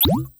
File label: fuel Card Scan